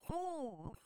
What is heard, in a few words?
pain low; VIVAE; vocaltrax